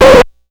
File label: Needle Drag, Vinyl Record Samples; Needle Noises